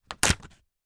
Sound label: foley cheque rip from book stub